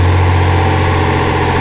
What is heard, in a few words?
l6 diesel medium, engines; acf engines